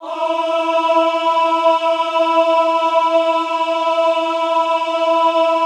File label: AHH E3, Classical Choir; Best Service; Extended Classical Choir; AHH MIX